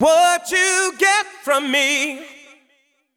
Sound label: deep house male, 125, A, vocals